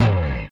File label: Rickenbacker Bass; Airwindows; Rick-Xtras